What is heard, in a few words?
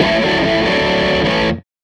Les Guitar Loop